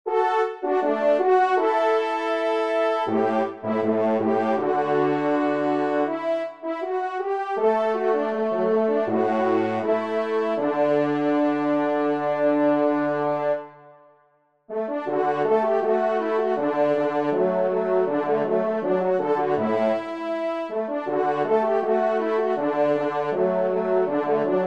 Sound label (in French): trompe; Le Tonnerre à la voile